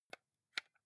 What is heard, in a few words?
console controller xbox onoff press slow soft